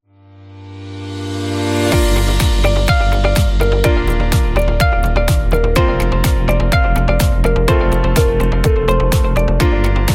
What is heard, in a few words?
Corporate Promo; corporation